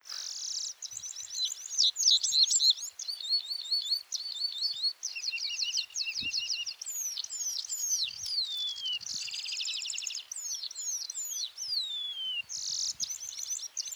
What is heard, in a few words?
Red throated Pipit Anthus cervinus, red throated pipit call